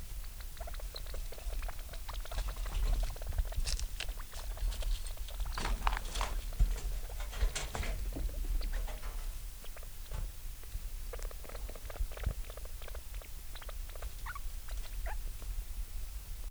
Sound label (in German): Fellpflege